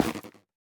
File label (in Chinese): 菌 破坏声